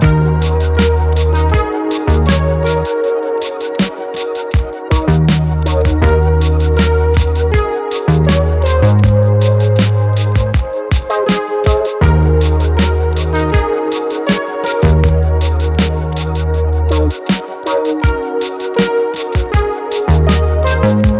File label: Menu Loop; Retro Racer